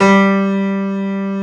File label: PIANO G3; PIANOS